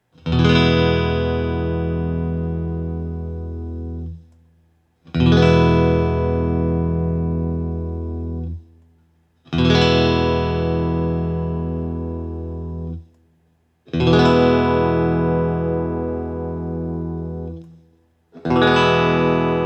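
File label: Guild S284 EMGs Tiny Tweed Open E, guitar; Guild S284 Aviator with EMG pickups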